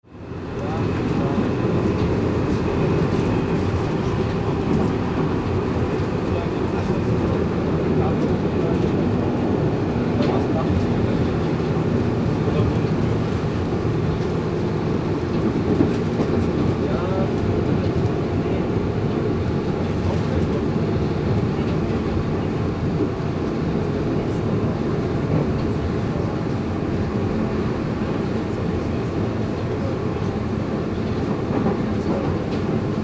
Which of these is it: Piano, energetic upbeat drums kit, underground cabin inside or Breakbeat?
underground cabin inside